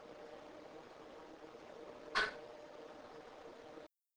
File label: Bang; north bend fire company